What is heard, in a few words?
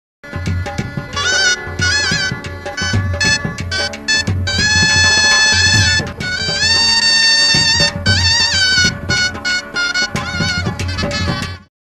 Shehnai